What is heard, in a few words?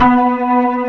organ b4